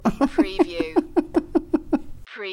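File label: Woman Chuckle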